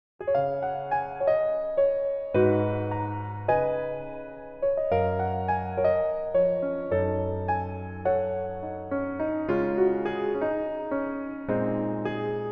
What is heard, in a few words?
Piano